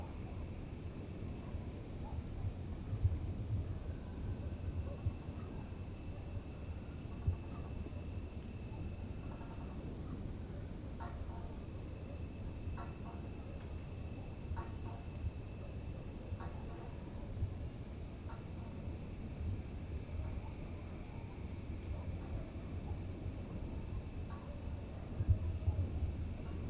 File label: nyepi evening roof wind